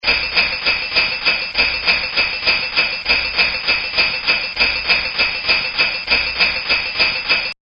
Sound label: Ringadingding; ringtones; Holiday Ringtones